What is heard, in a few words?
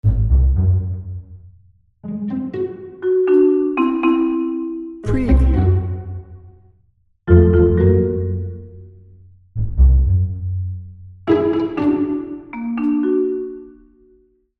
quirky pizzicato loop